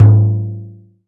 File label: open hand Timbales; E-MU Producer Series Vol. 2 – More Studio Essentials; Composer; Timbales Xswitch